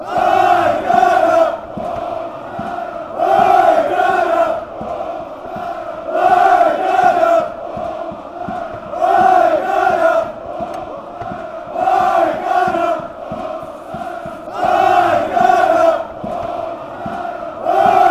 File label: aek athens chant